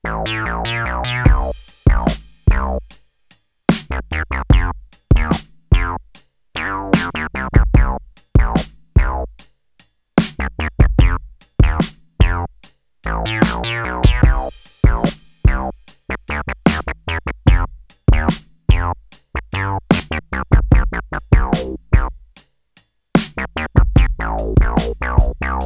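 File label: RUBBBER BASS with Drums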